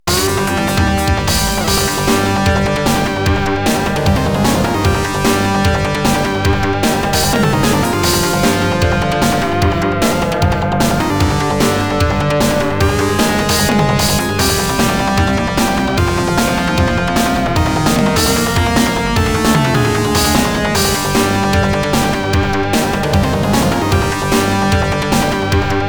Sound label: Crash Beat; Original 16bit Sound Collection I; Mad Stalker; For True Mega Drivers